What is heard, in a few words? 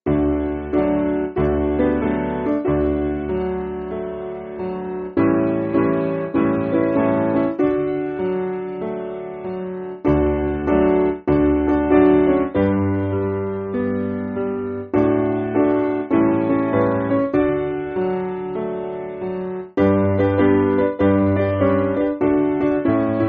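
Piano, Free Music Backing Files for Churches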